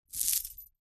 jewelry necklaces pick up from carpet; zapsplat

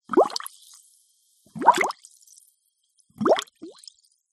food drink bubbles blow straw soda glass fizz

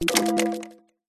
science fiction computer; Audio Mangler

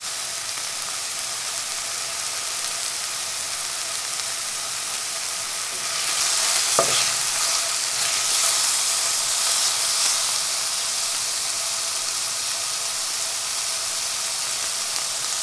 food cooking frying chicken pan sizzle; zapsplat

